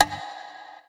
Castanet Reverb, One Shots, Drums, Misc Percussion